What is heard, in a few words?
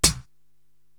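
HH Circle Close, hi hat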